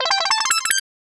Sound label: fire flower